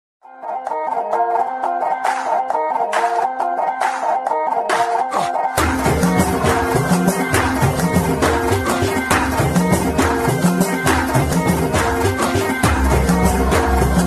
background music ringtone; Prince

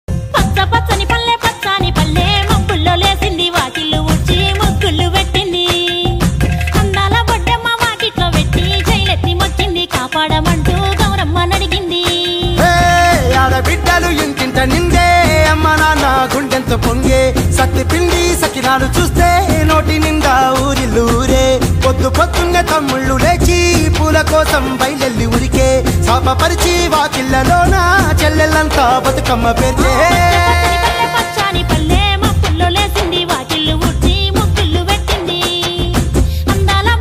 telangana bathukamma ringtone telugu ringtone; navratri special

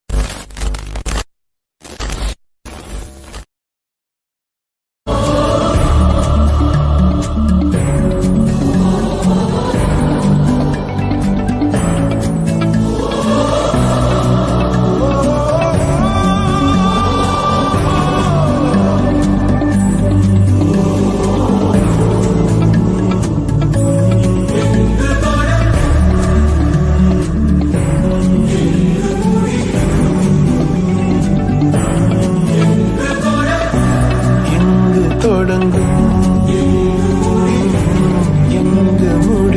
Tamil bgm ringtone

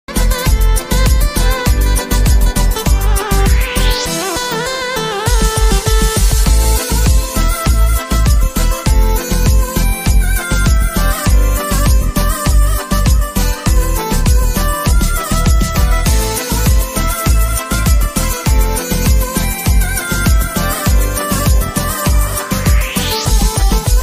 love bgm ringtone flute; tamil ringtone

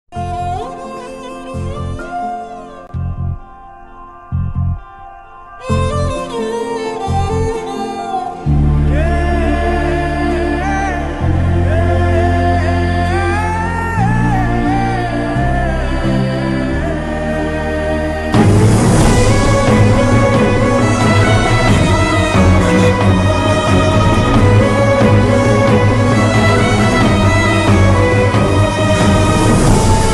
love bgm ringtone, south indian bgm ringtone; uppena movie; famous telugu bgm

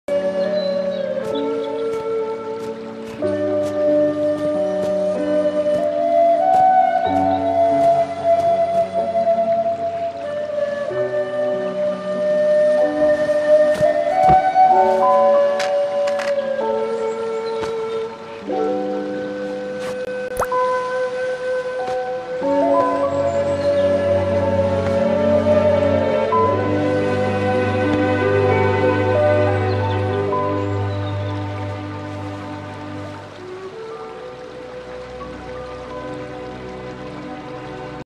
hollywood music hindi chinese ringtone song

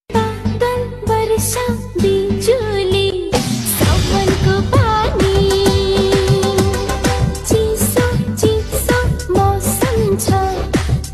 song ringtone

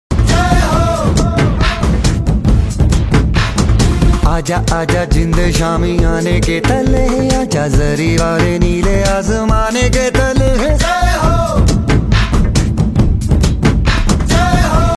desh bhakti song

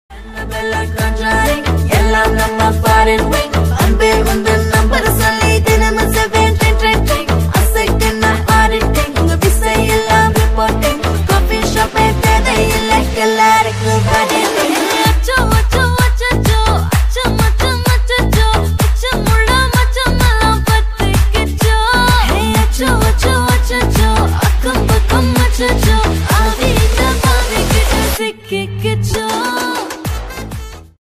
best south ringtone; aranmanai 4

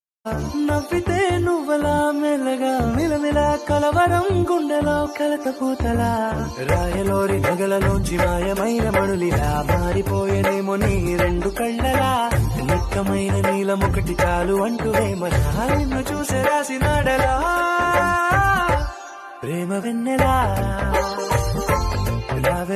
best love ringtone, telugu love bgm ringtones

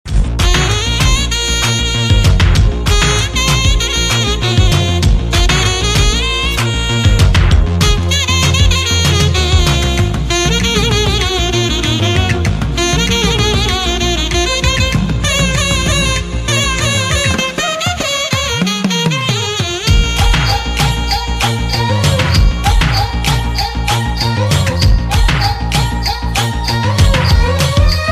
tamil love ringtone, bgm ringtone; caron tunes

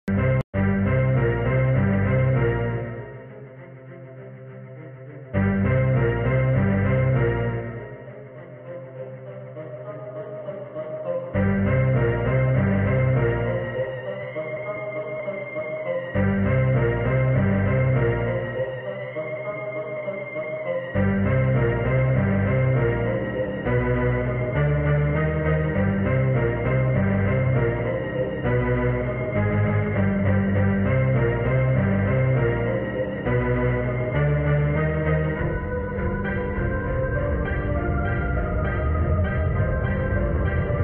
intro bgm ringtones; goat movie; thalapathy vijay

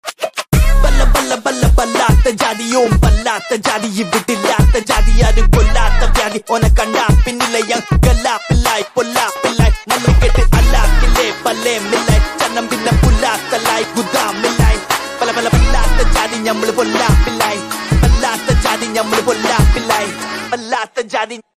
Malayalam ringtone

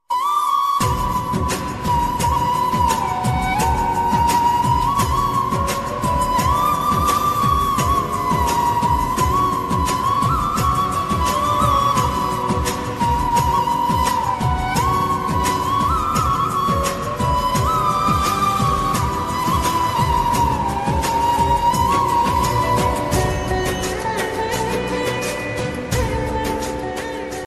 mungaru male ringtone kannada bgm ringtone

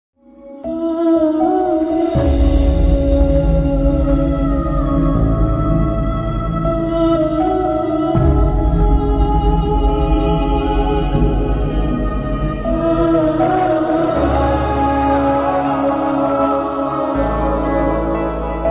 emotional bgm; varisu; thalapathy vijay; vamshi padiapally

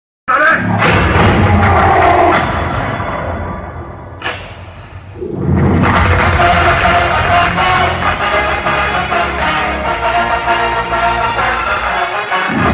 hero entry bgm; Double Ismart; Ram Pothineni

